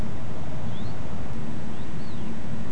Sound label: Common Rosefinch call plus song element; nocturnal flight call; Beijing